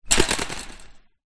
impact fence chainlinked hit pull

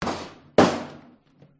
pub wooden chair set down on wooden table; field reports

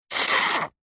hospital syringe squirt foamy liquid

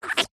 cartoon squeeze pull squeak rubbery squelch

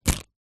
leisure cards game few down on surface; zapsplat

